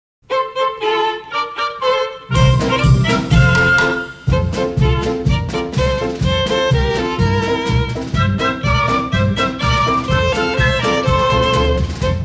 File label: polka (instrumental)